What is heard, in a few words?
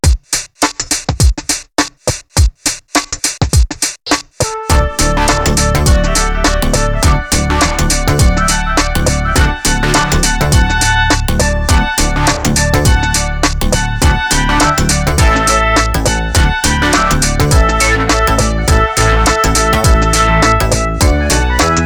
music for the royal fireworks 4th movement; Handel’s music for the Royal Fireworks 4th movement